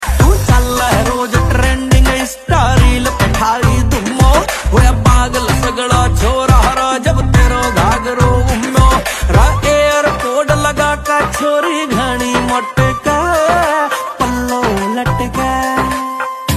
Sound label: Jaago Ringtone Hindustani